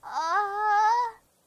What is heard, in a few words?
Uhhhhh; Momiji